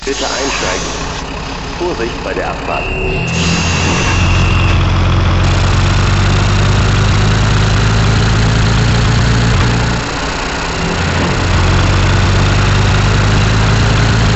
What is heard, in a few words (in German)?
Märklin Sound